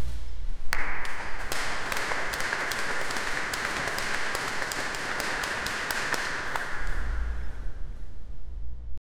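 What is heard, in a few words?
a dramatic theater curtain; theatre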